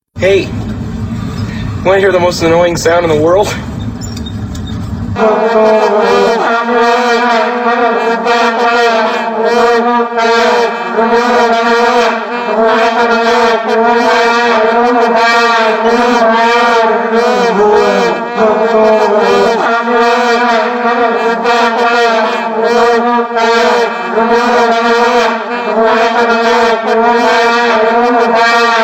Most Annoying Sound

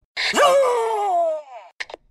Zoooooooom

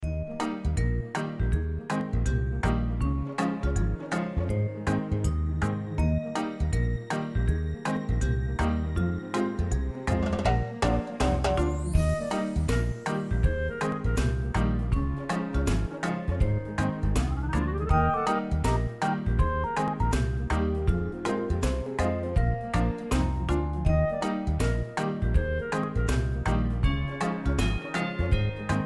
nice melody

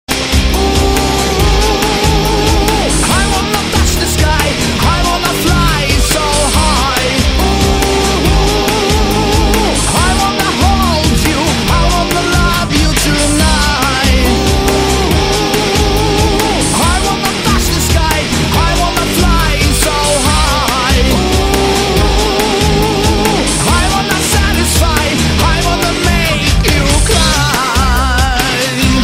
Metal Cover

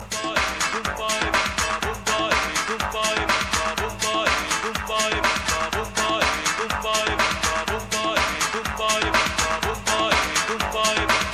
ringtones

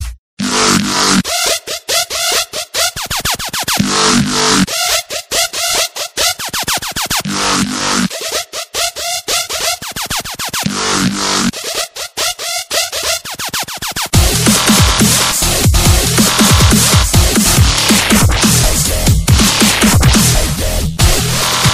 Rare Wubbox Ringtone

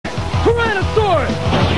Tyrannosaurus

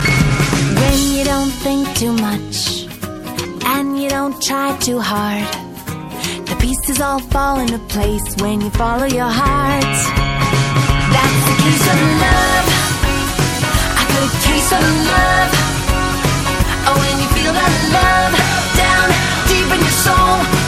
Is Calling RNB

